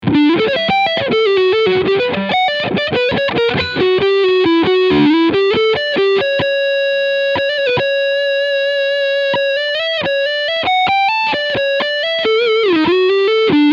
Gibson Les Paul Standard 50s Leadsound Neck Pickup